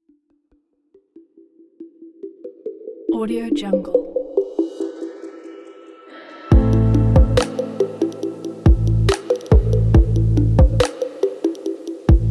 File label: ambient; artsolomedia